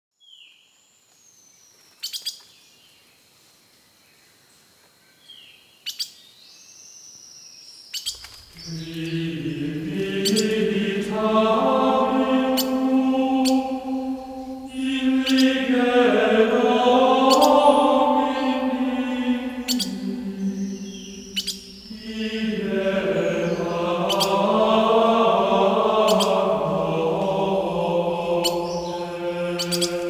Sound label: Ethereal Forest Birds